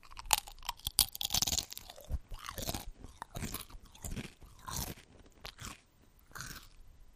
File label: Noisy Crisps